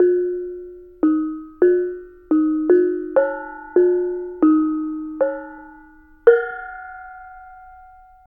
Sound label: BONANG; Spectrasonics; Heart of Asia; BONANG PH